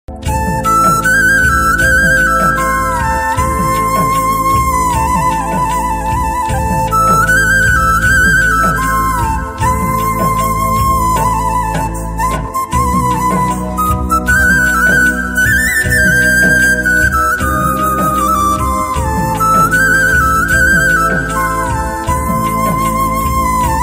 flute ringtone, bansuri ringtone, instrumental ringtone